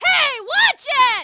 yell; Matt Hoffman's Pro BMX 2; XBOX

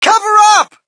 crowd, shout; Mike Tyson Heavyweight Boxing

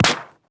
paper hit; projectiles; bullethit; Max Payne

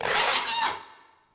cry; Star Wars Obi Wan; XBOX

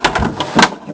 door; The Elder Scrolls III Morrowind GOTY; XBOX

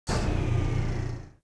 shut, ships; leah

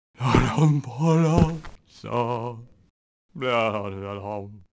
monk fidget; Sid Meiers Pirates; XBOX

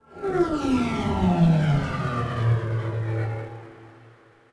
flyby distant, vehicles

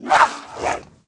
Mutant Attack Slash; Valleakan Mutant 2; Aliens

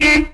4WD horn

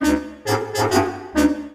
Loss

